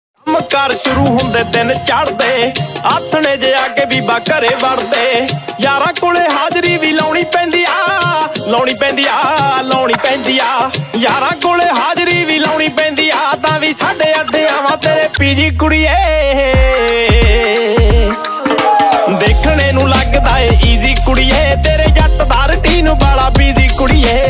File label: Ringtone